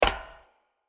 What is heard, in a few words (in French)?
Footstep passerelle metalique; Blind Survivor